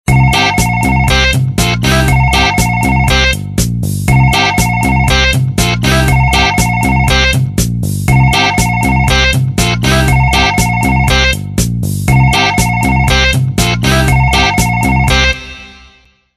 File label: ringtone rock